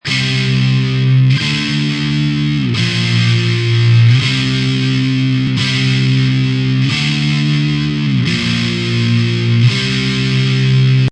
Rocking distorted electric guitar chord progression LOOP, 87 bpm Cm; Part of Guitars